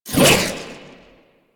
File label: Crack Retract; Warframe; Warframes; Khora